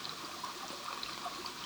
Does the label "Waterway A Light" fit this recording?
Yes